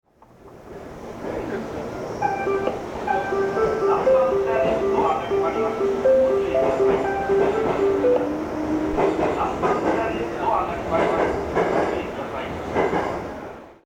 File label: melody; 3番線（山手内）発車メロディ; kanda; jreast yamanote